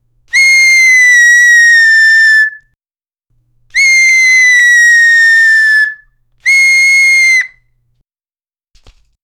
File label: three quick half second, whistle